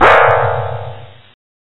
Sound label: Suspense Chord